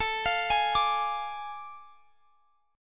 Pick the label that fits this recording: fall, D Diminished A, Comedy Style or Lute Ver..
D Diminished A